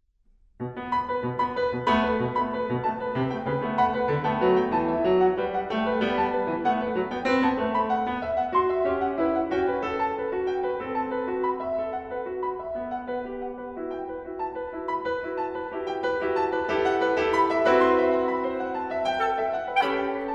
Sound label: claviola; Juozapaitis